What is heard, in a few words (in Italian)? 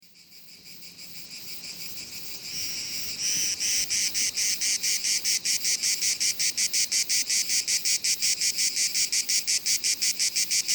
cicala, Insetti; Monte Pisano; Animali; I Tesori del Monte Pisano - I Volume Gli Animali